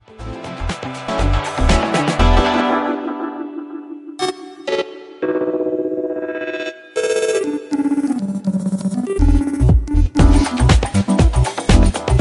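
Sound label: two bar house music and chord stuff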